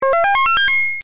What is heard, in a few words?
sms